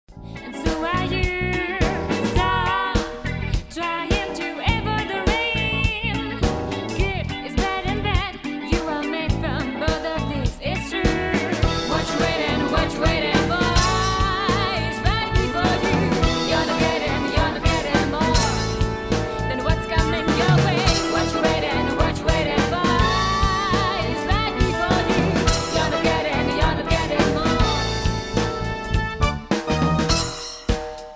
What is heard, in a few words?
mix vocal